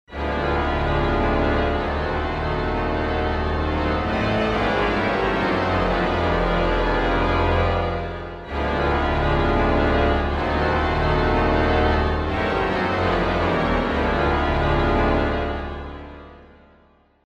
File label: Organ Sting